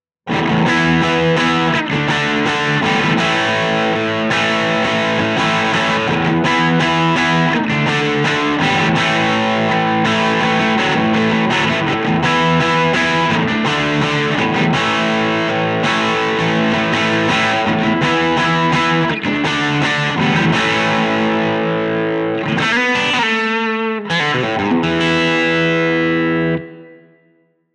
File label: crunch; jensentone